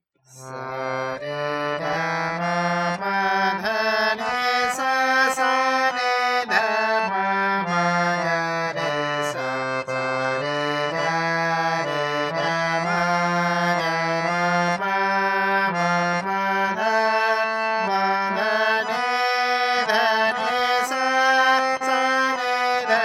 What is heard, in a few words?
vocal